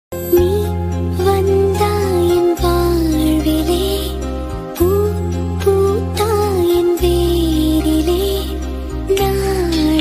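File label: tamil love background music ringtone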